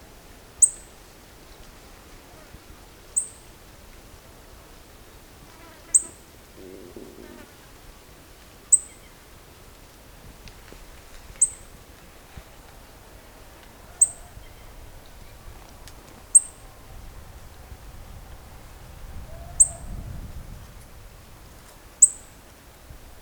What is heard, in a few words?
paroaria dominicana